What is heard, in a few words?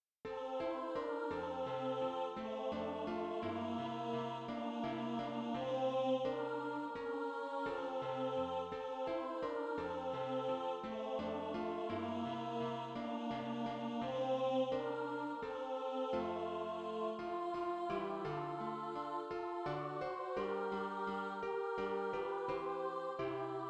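tenor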